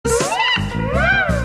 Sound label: wolf whistle